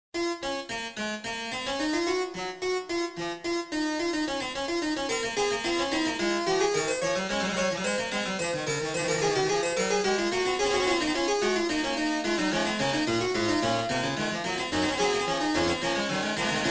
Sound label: 19 edo Fugue; Xenharmonic Alliance